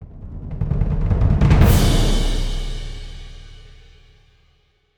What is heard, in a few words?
Orchestral Roll with cymbal